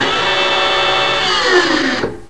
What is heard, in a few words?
flaps; sven; caged